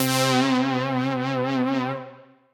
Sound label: Funk Lead, Synth Lead; Ableton; Chop and Swing